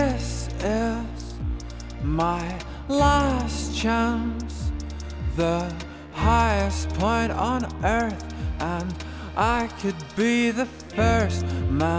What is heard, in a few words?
noisy, vocal mix